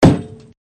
collide floor; ball rolling game

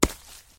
button; indie cannon

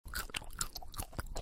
eating; hangman

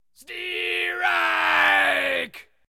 strike; baseball bash

